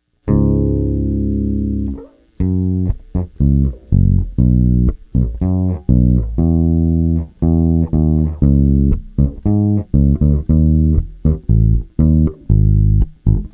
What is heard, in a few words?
Bass